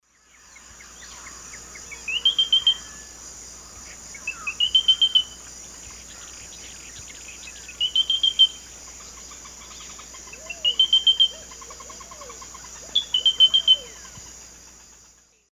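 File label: Laterallus exilis, song playback; S. America; Eastern Lowlands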